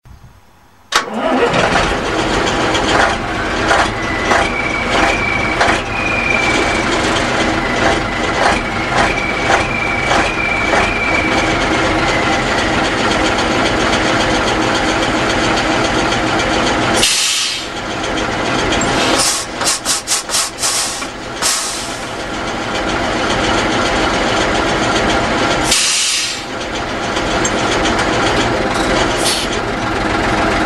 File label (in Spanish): pegaso 1083 nuevos sonidos